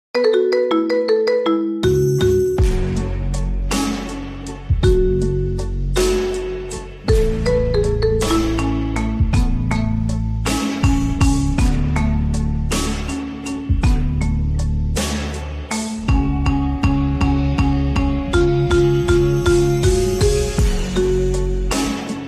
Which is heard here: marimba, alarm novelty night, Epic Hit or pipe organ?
marimba